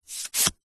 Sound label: paper card business card take pull from reluctant hand